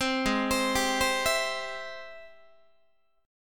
Ab Augmented Major 7th Ab Guitar 4A,4E,5A,5D,5G sharp,6C sharp; arpeggiated; JGuitar